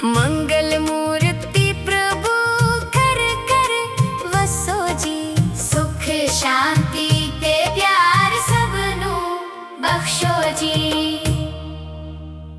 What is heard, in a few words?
punjabi ringtone; ganesh